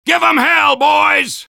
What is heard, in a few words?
soldier battle cry; vo